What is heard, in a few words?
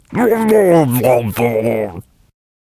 Death Vocals